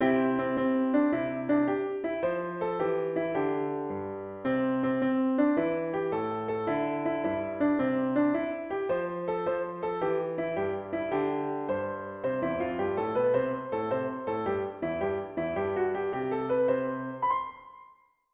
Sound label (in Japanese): ピアノ